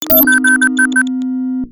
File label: Machine Startup Beeps; Lucius III